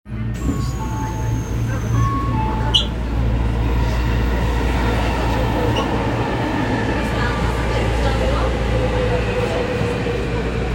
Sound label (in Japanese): 千代田線乃木坂東, train sounds